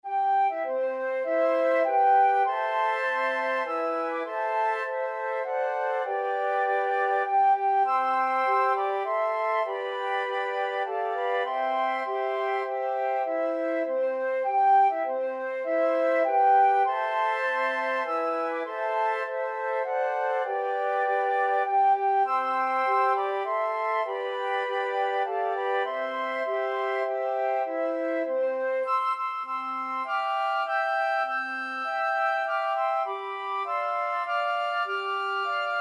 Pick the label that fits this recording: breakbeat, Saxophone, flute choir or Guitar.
flute choir